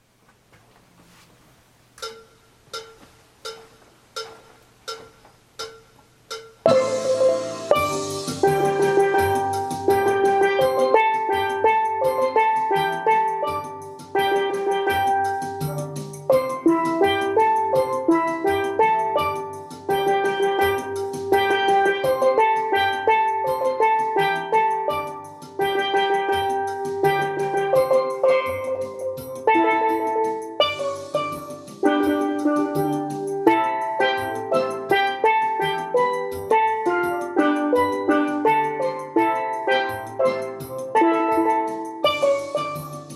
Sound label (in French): Ténor Intro Couplet refrain